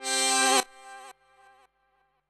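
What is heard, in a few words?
synth, 165 BPM, drum & bass; Ueberschall; Drum & Bass Resonance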